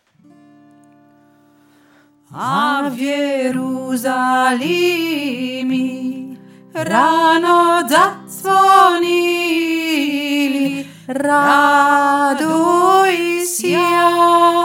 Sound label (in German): ukrainisches wiehnachtslied hauptstimme